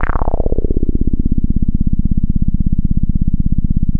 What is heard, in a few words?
JX8P bassics C1, vintage keyboards; Big Fish Audio - First Call Vintage Keyboards